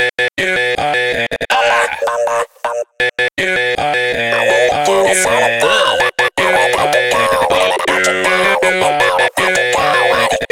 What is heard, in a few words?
Voices